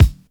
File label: loud steel kick drum e key, kickdrum sound tuned to the E note; kick drum samples